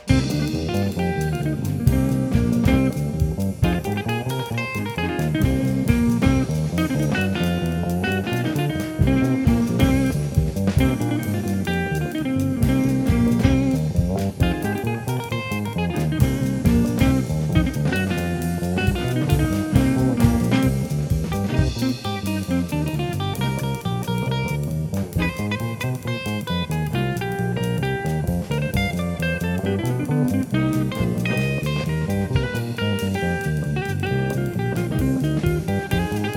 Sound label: jazz in studio